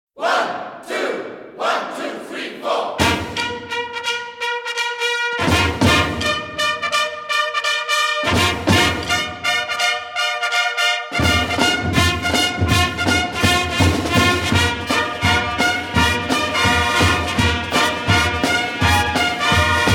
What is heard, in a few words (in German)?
Fanfaren Rock